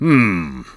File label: Hmm; Sir Combsly: "Hmm..."